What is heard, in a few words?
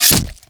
attack; dota; kez